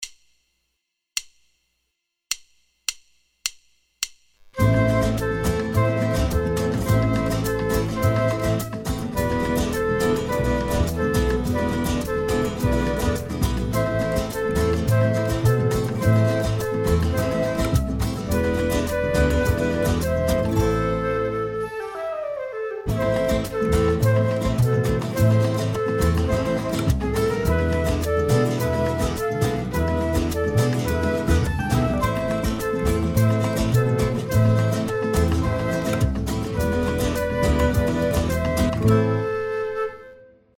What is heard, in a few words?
ABC Song Flute